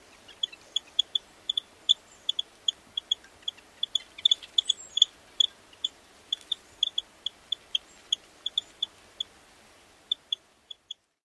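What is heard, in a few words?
pik calls, purple finch; NY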